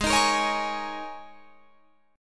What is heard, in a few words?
G sharp Suspended 2nd Suspended 4th, G sharp, down, Guitar, Drop D